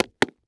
Foley Footstep Hard Sole Dress Shoe Jump On Cement; Foley Footsteps